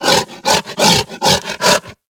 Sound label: Kubrow Feral Vocal Breath Run; Enemies; Warframe